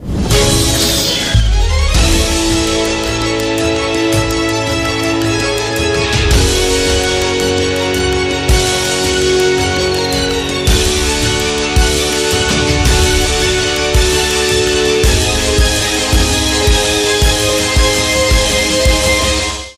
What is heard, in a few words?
big Win; shangrila